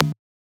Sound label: perc